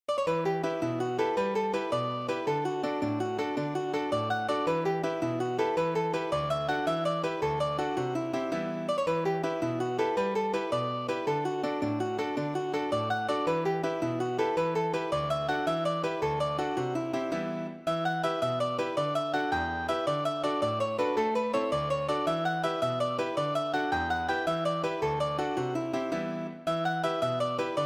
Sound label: jig set